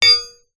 impact metal dowel thin on concrete post

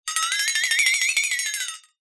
cartoon flexatone

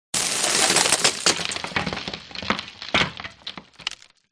crashes wood; Audio Mangler

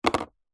foley tub small thick plastic set down table

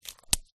latex glove stretch release put on; foley

